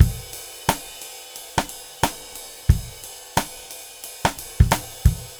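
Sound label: 89 bpm, beat loops; Zero-G; Monster Beats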